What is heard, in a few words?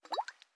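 ambience; SoundBank AMB CC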